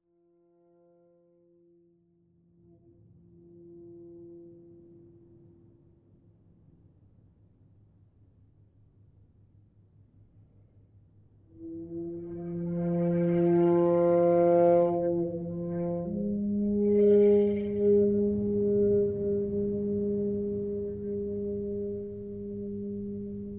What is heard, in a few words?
Meditative Flute